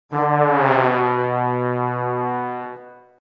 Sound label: Music effect - Jazz trombone slide down; Hollywood Edge Sound Designers Tool Kit